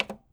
Button - Push, keyboard

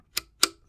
Model D - Switch, keyboard

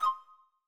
back style 5; inteface sfx pack 1; Back tones

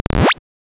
upgrade, Retro Sounds 32-Bit

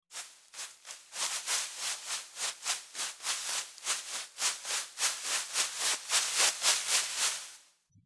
Leaves Footsteps; horror; horror sfx vol 1

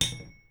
parry

